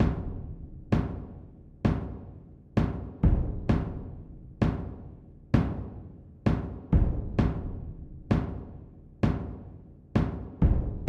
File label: Drone, Only Rhythm